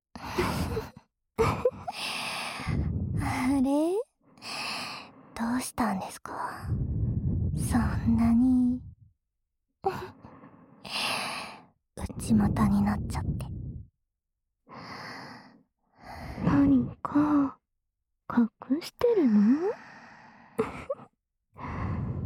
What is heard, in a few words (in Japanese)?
両耳舐め; 手コキで挑発！; 女子寮の管理人になったら精子が枯れそうな件!